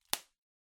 Bubble Wrap (Pop Close); 気泡緩衝材; プチッ 近い; 梱包材

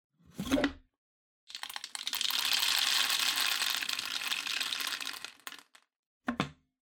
Drawing Machine Garapon Ball Put In

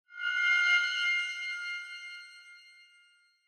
Horror Accent Short

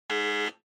Electromagnetic Buzzer, Dry

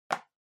Tablet PC Multi Touch

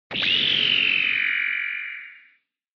Retro Anime Beam High Long

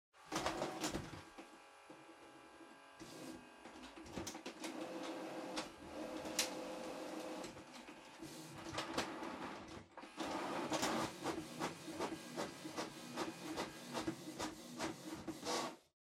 Printer Far Copy

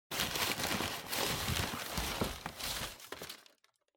Cardboard Box Rummage; ダンボール03-06(ガサガサ); 効果音＞梱包材

